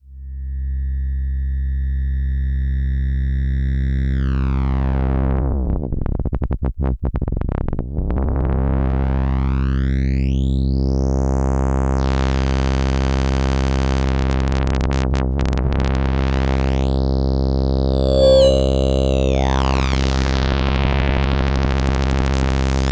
Mod Jam; Nanozwerg

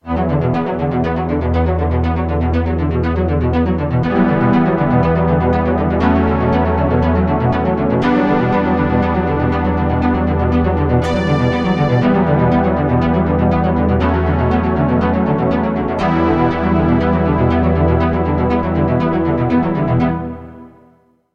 E String Arpeggio

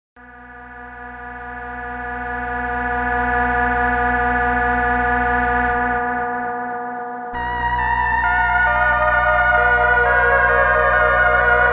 Dreadbox Erebus Reissue

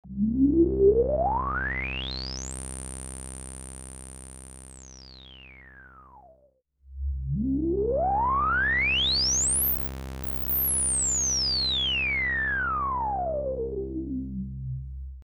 resonance minimoog

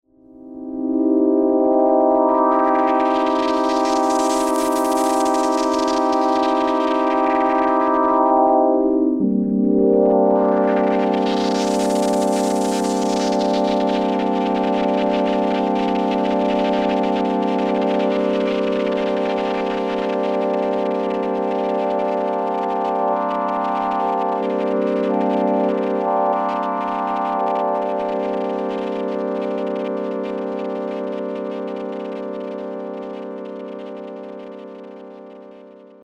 microfreak V4 User Wavetable

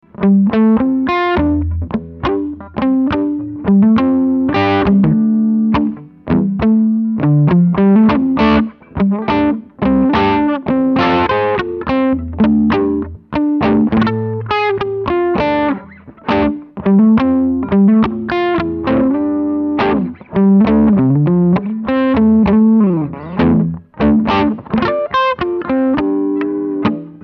Blues playalong bridge; HB

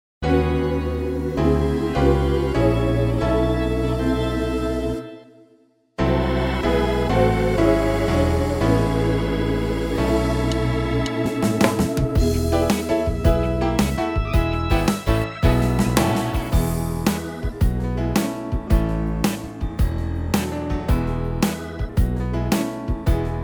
S975 Gospel Swing

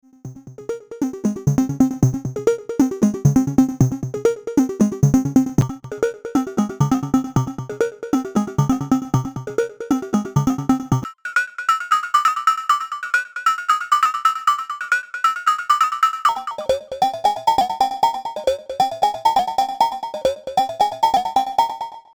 Freq Shift

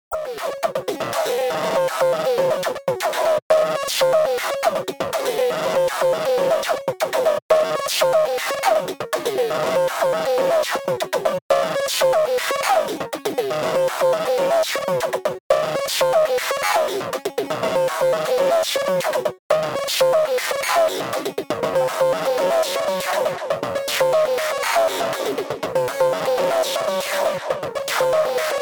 Digital Meltdown

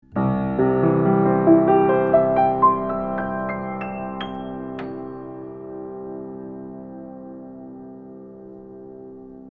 Warm Audio WA-67 Mono Piano Cardioid

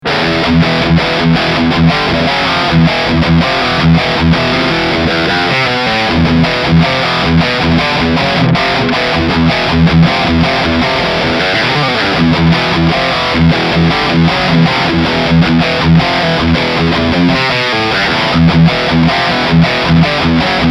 Joyo GEM BOX III Preset Metalcore Rhythm

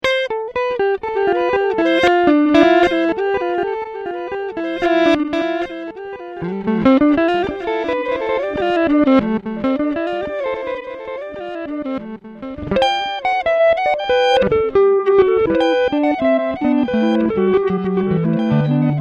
Reverse

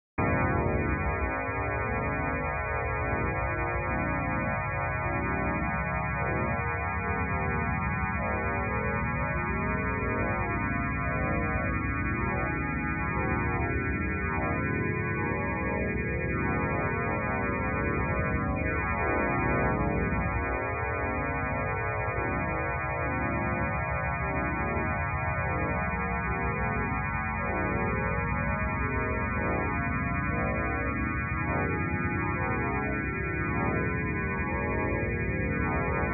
Virtual ANS 3

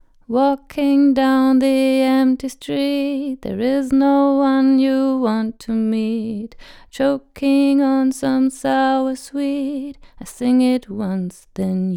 Vocal; Rode NT1; Maihak V41

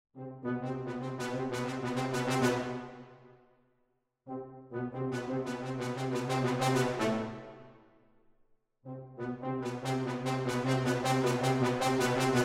Orch Ens Staccatissimo; Nucleus

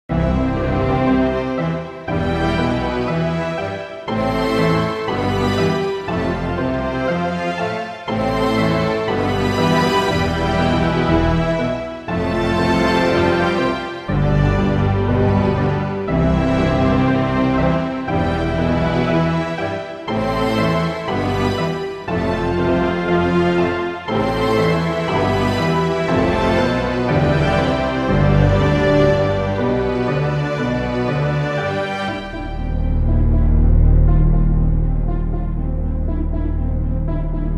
JunoDS88 Orchestra Section

